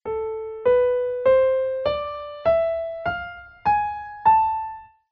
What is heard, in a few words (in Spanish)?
escala menor armonica